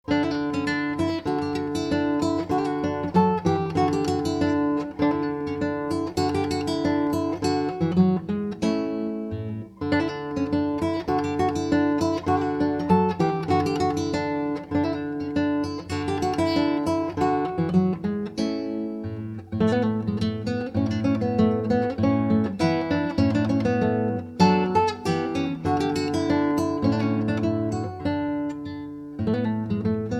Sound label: Classical Guitar, Baroque Spanish Dance; Gaspar Sanz